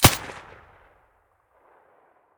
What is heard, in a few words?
silencer; S.T.A.L.K.E.R.; IWP; vityaz; pp1901